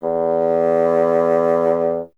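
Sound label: wind bassoon E; Miroslav Vitous - Solo Instruments VOL-1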